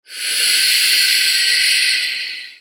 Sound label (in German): Rauschen